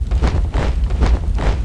run; FFocalor; Seer; GamigoNA

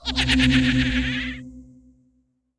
arch mage book idle

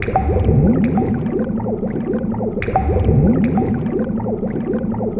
Dark Fog Stand; Seer; GamigoNA

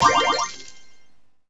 Dice Out Money; Seer; GamigoNA

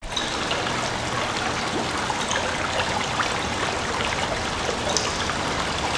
Spa

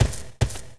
Goblin Swordman Run; Seer; GamigoNA

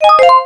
item drop; Seer; GamigoNA

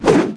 jump

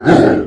Angry Yeti Damage; Seer; GamigoNA

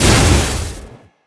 Impact Light

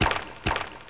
Skel Warrior Walk

